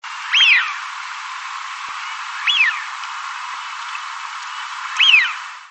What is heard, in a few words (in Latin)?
anas penelope